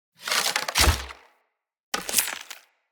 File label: Hunter Bow Ranged Finisher Ground; Weapons; Player; Bows; EnvoyHunterBowRangedMelee